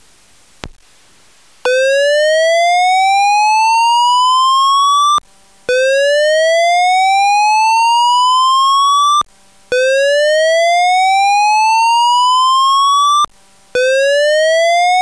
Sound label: slow whoop